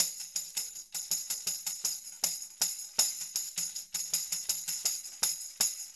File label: 80 tam; Percussion